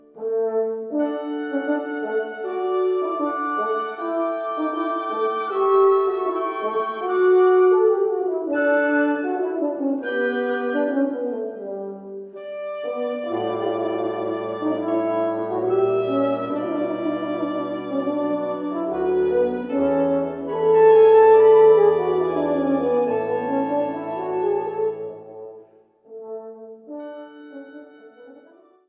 Quintet in A Minor, Variations horn

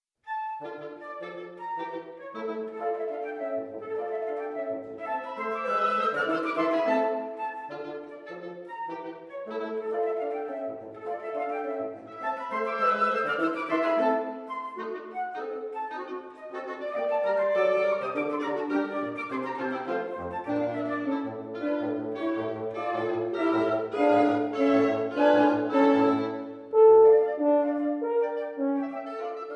Quintet

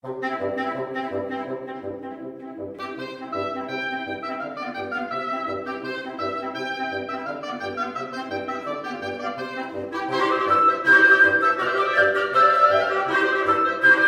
Woodwind Quartet; Mvmt V

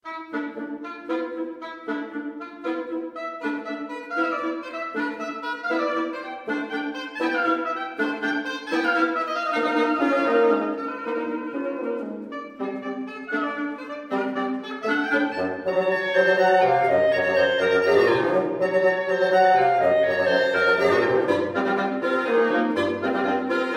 Wind Trio; Mvmt III; woodwind ensemble

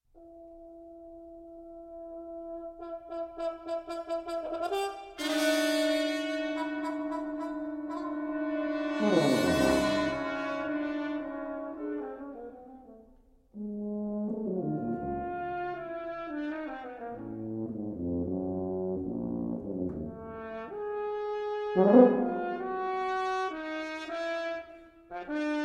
Brass Quintet; Parable for Brass Quintet

